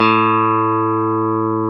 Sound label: GTR, Dual Guitar; Club-50 - Foundations; Paul